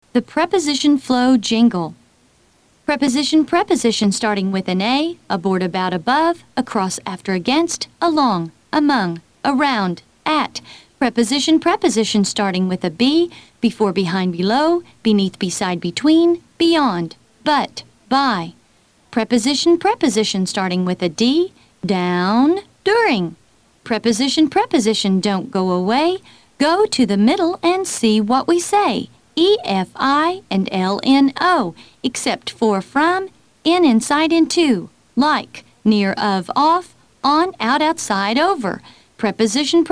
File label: preposition flow jingle